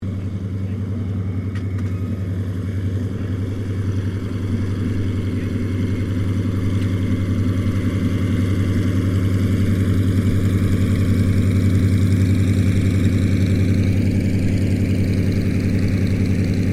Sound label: AIRCRAFT YAK82 TAXI CLOSE; Various unidentified aircraft; Airplanes; Vehicles